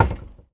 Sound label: Foley